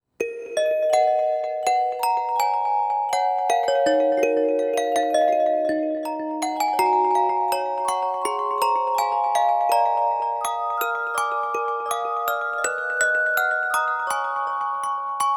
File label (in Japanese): オルゴール; Higurashi no Naku Koro ni Hou